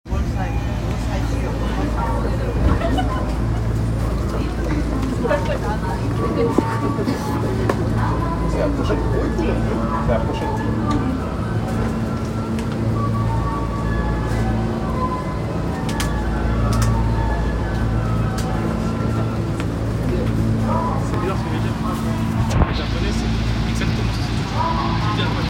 丸の内線銀座西, train sounds

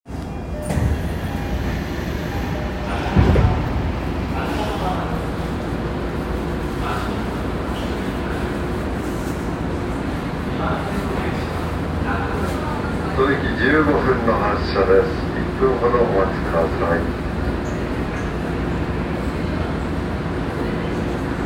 東西線高田馬場西, train sounds

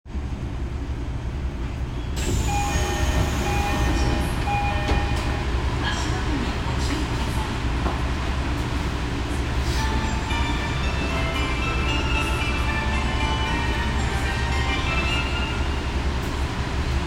有楽町線護国寺東, train sounds

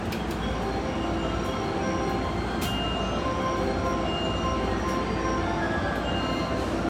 JR中央・総武線西船橋西, train sounds

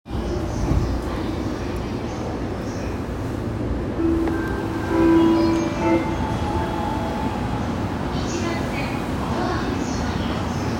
中央・総武線阿佐ヶ谷西, train sounds

